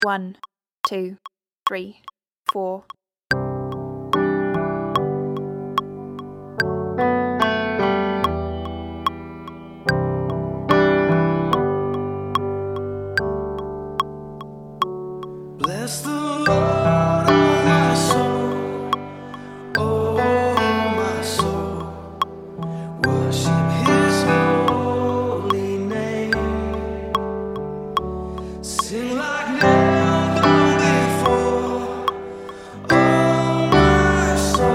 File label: keys ref